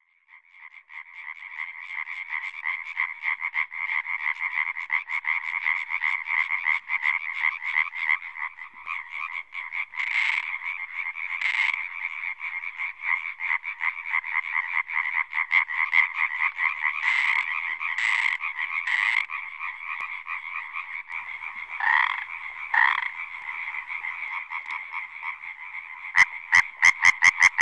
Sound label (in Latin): Hyla arborea